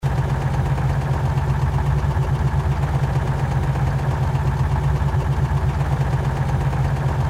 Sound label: Jeep Ride Audio, vehicle; mohaa